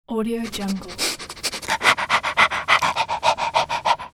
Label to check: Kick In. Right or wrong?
Wrong